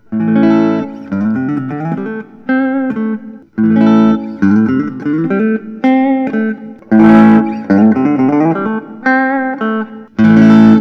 parker fly supreme transparent; hon

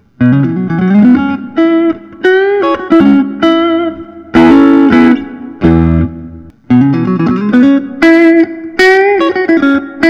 lentz dl90 1 piece ash natural

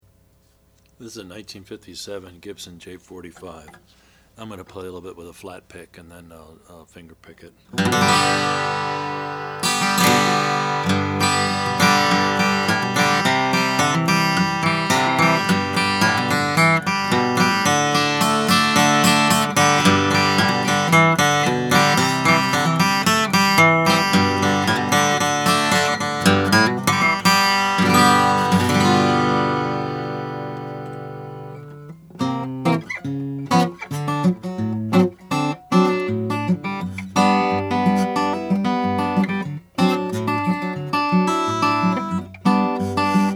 gibson j-45 sunburst

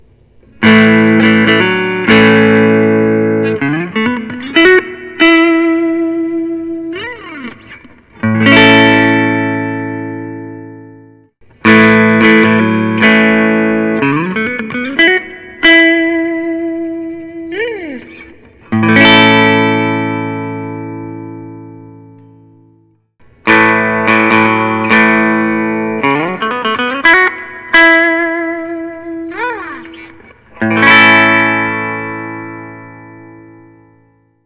Paul Reed Smith Hollowbody I; 2008; natural

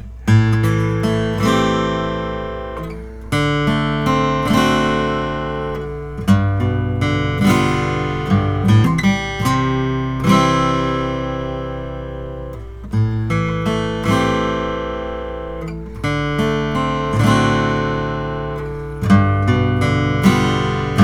Goodall RP 14 parlor short; natural

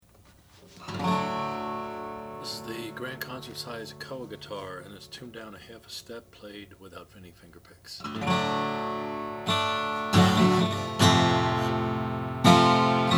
oscar schmidt hawaiian guitar natural koa

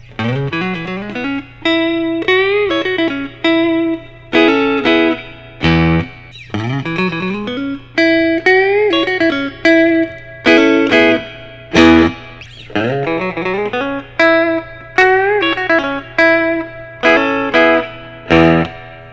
Fender Custom Shop Wildwood 10 59 Tele

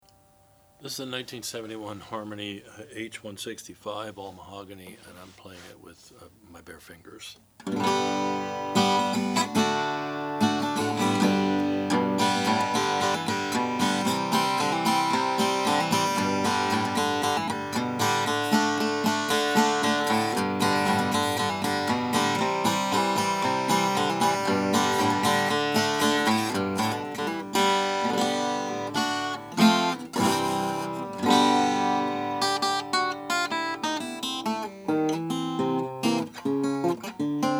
harmony h165 mahogany

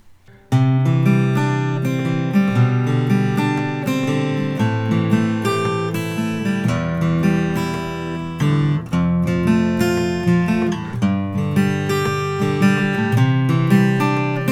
martin custom 00 12 fret cutaway

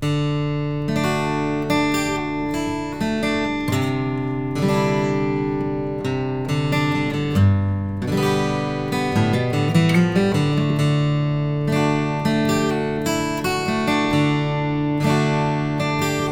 gallagher g 70 madagascar 12 f nat

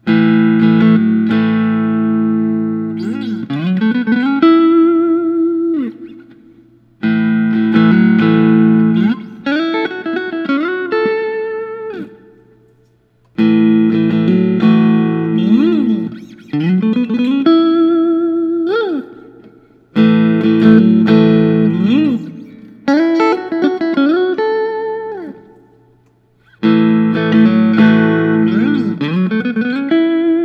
fender custom shop 60 stratocaster

